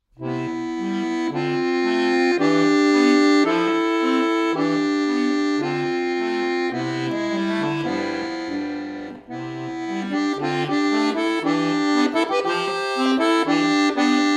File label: bad joker instrumentale